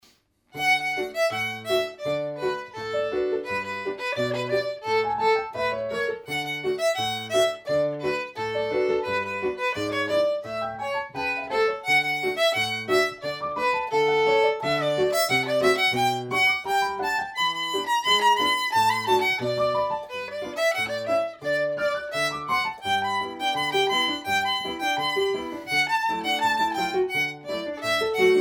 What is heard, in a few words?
Ragtime